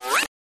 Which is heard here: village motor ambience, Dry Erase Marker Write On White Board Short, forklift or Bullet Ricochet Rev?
Bullet Ricochet Rev